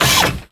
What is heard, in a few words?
cursor